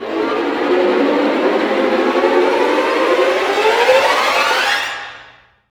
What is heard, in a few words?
STR SEC, STRING GLISS